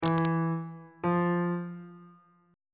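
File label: e sharp diminished 8 perfect 8 intervals on bass clef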